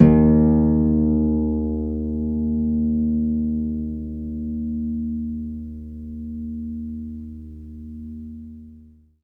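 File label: HARP F2 SUS; ILIO; Synclavier Strings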